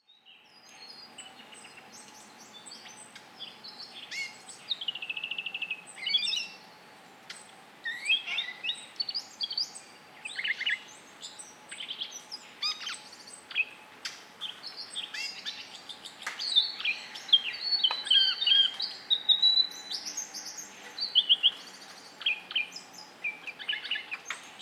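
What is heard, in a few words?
Yellow throated scrubwren, bird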